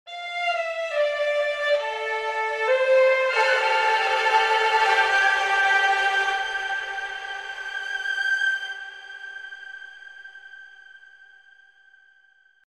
Gaohu Ensemble Sustains Legato Thrills Swells Valhalla Reverb; Phoenix Orchestra